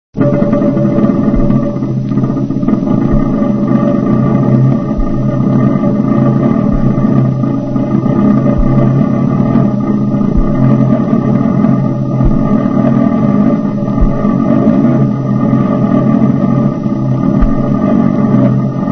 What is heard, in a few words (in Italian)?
crepitatore; rusolo; futurismo